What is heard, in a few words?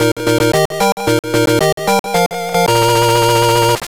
victory; nintama rantarou ninjutsu gakuen ni nyuugaku shiyou no dan; gbc